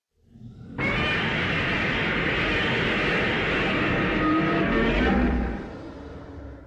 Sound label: roar; shin godzilla